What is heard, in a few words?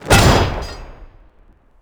baz hit metal; WAR